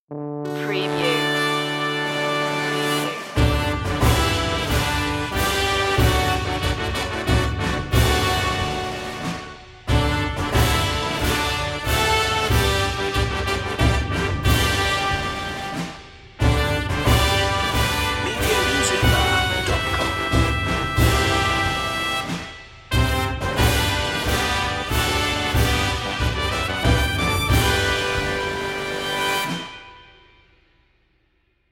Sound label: happy birthday to you marching band style; Speciality / Novelty